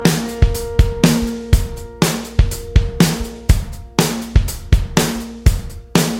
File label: Drum Outro